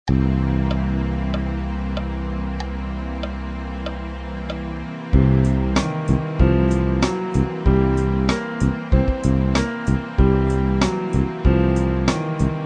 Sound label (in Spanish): blues contra C7